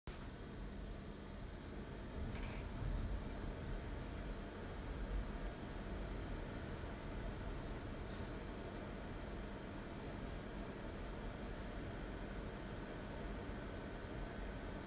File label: amd fan